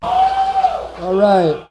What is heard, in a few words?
woo all right; ooh ahh; ho hoo woo